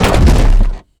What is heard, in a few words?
robot footsteps; soundwave; Bluezone Corporation